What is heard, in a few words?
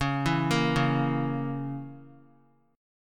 C sharp Minor 6th; arpeggiated; chord; JGuitar